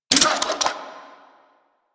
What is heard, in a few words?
Necramech Repair Drone Claw Calibrate; Enemies; Warframe